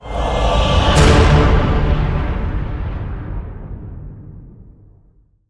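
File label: Hit Orchestra Cave Men D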